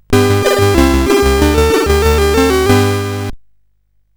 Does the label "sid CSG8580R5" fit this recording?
Yes